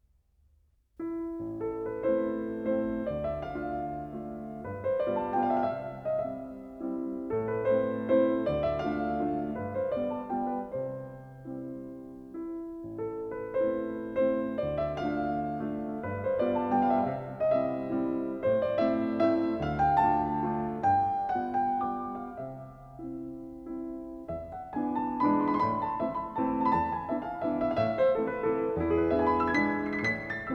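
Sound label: Waltz in A minor, piano; Waltz #19; Op. posth.; CHOPIN; Nikita Magaloff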